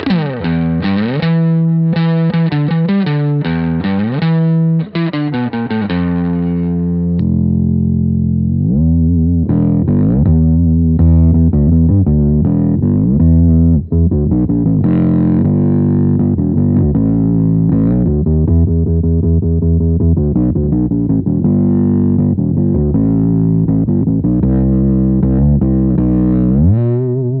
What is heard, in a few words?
Bass Guitar Bass Octave